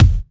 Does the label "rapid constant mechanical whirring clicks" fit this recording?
No